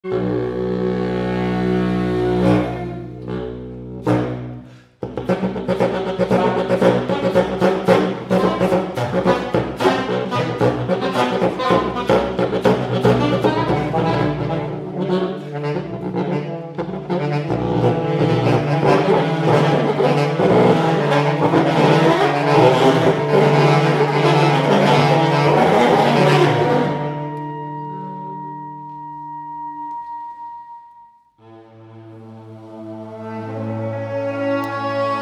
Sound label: Sax Stories excerpts